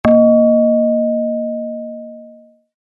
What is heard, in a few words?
tone